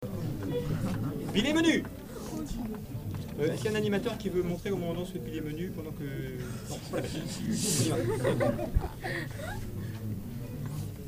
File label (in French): harpes violons; pile-menu; charivari